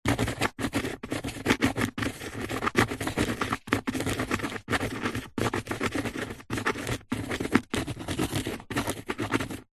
office pen biro ball point write fast on cardboard piece